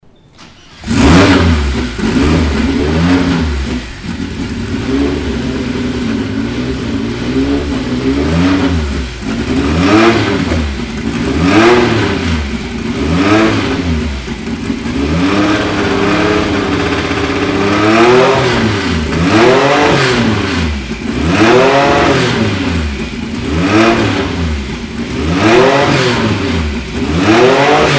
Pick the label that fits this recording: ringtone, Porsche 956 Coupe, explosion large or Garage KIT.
Porsche 956 Coupe